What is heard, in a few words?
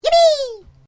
Created Creature Small Yippie, Alien Sound Effects; Created creatures, vocalisations and pretend speech